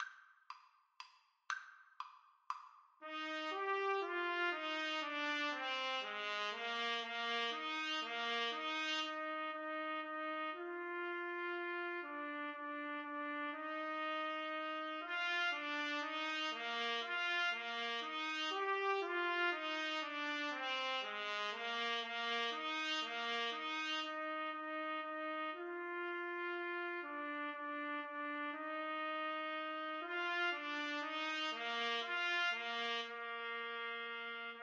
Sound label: Menuett TPT duet